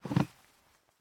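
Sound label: Server Battery Take; raw game audio files; MiSide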